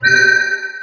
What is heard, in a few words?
gate; Battle